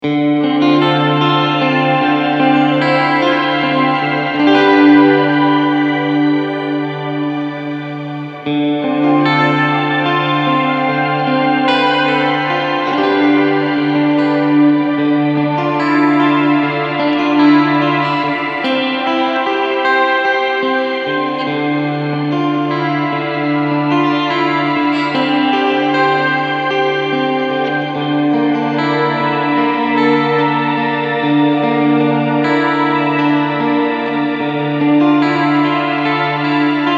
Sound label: Reverb Shimmer